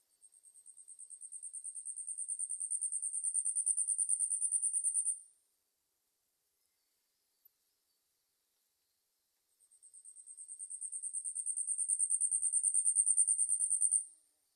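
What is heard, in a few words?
yabusame, Asian stubtail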